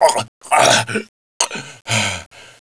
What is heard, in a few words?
choke; SINDEMO; player; blade